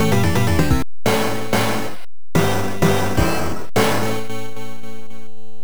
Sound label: Battle Cry; fighting run turbografx 16